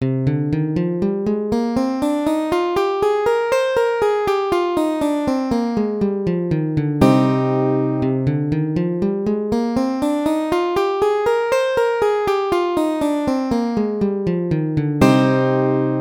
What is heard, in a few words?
cm aeolian scale